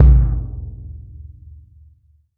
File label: Odd Kick Layer; Kick Snare Layers; Drum Bits; layering